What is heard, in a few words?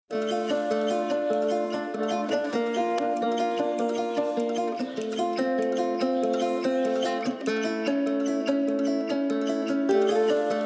instrumental, persian